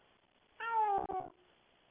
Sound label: cat meow